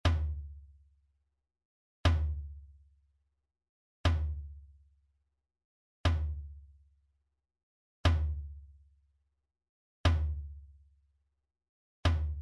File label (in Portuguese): Toque - Surdo Mor